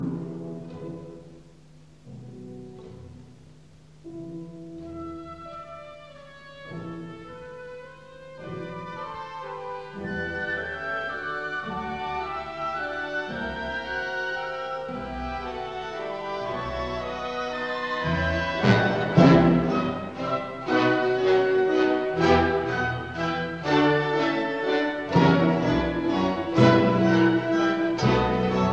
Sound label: Symphony in E minor Allegro energico e passionato; Brahms; Op 98